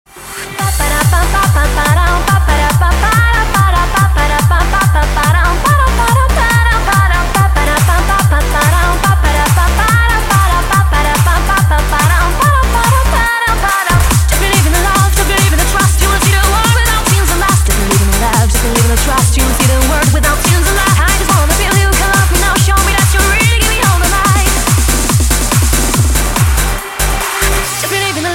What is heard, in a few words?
Positive Beat